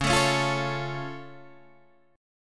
C sharp Augmented, C sharp

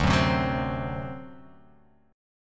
D 7th, down, Guitar, Open F; D7 strummed; JGuitar

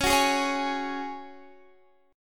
C sharp Suspended 4th, Guitar Standard; C#sus4; strummed; 21,21,18,18,21,x; down; capo=9

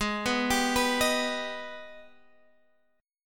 Ab Minor Major 7th, down, Guitar, Standard; AbmM7; arpeggiated

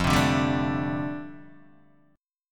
F 6th Add 9th F down Guitar Open D, chord

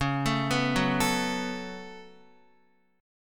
Db 7th Suspended 4th Sharp 5th Db down Guitar

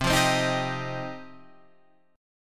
F 7th Suspended 2nd, chord

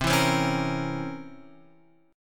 C 7th Flat 9th C Guitar Open F; C7b9 strummed; JGuitar

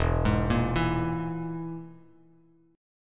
E Major Flat 5th E, Bass Standard; arpeggiated

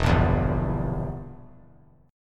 E Diminished down; Edim strummed; JGuitar

